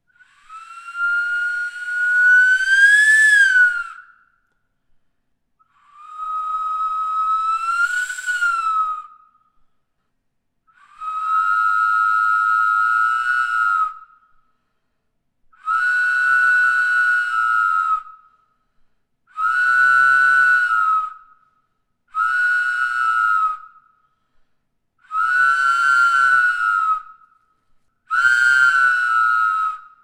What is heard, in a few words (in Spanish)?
silbato doble en efigie zoomorfa